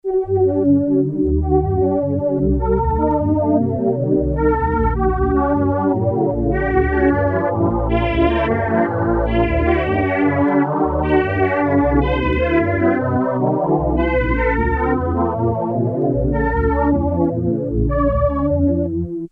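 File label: pad voice, analog polysynth; pad: choir; Solton Project 100; Solton SM100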